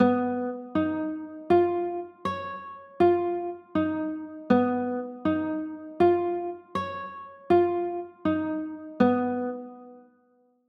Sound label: c sharp 6 9, chords